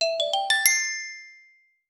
a cheerful xylophone jing

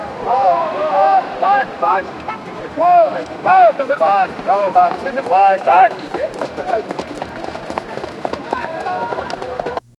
busy street in uptown new yo

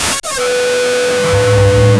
distorted reveal sting

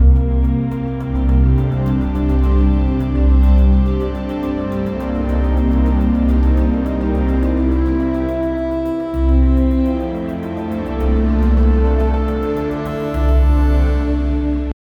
cinematic beats with a, electronic pulses

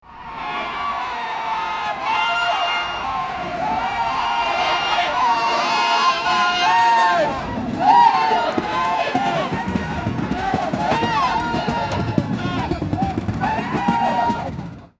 a group of 500 soldiers, marching

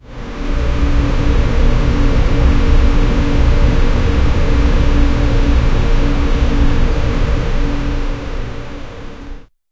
slow deep breathing, creaking

